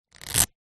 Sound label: velcro shoes childs pull; foley